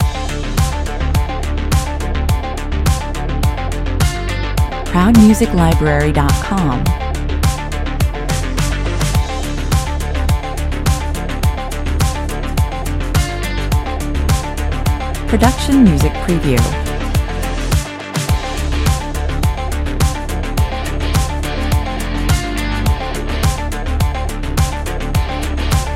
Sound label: loop